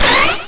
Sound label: cursor